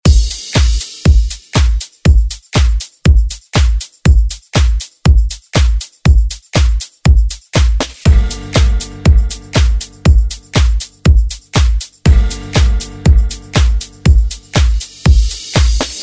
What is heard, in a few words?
70s Dance Redrum